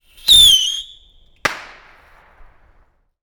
Fast whistle firework; ban phao hoa

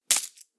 Money bag drop; roi xuong

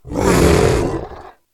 Big wild cat scary roar

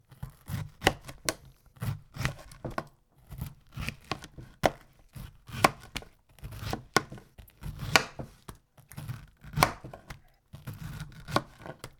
Cutting hard vegetables with knife

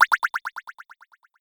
Magic bubbles spell; ao thuat

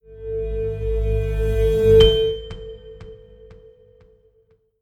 Introduction bell sound

